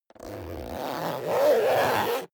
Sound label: zipper down jacket close